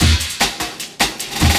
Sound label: LOOP, 150 BPM, Drum & Bass; Ueberschall; Drum & Bass Resonance